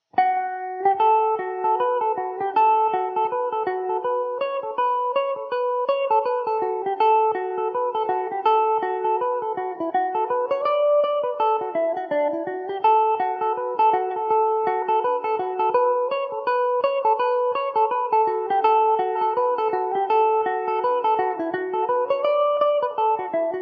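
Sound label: concertina reel D major mandobird, irish mandolin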